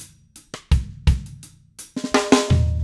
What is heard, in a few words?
Acoustic Drumloop